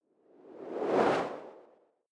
whoosh simple flutter

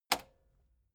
metal button spring click fast

